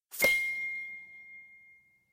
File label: Note C5